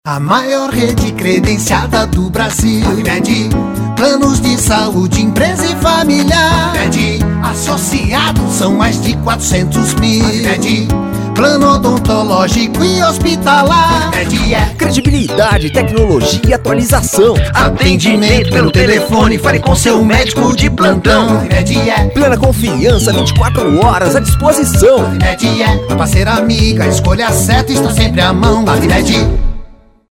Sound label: JINGLE; AVIMED